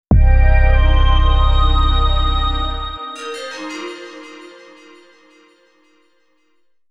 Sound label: Jingle; Fate Tiger Colosseum; Fate-Tiger Colosseum ORIGINAL SOUND TRACK [Complete Edition]